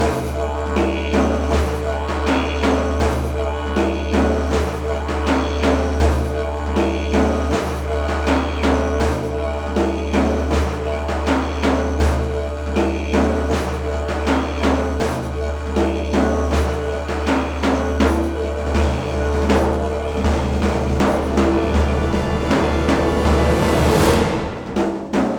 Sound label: General Combat Layer; The Witcher 3 Wild Hunt; Ard Skellig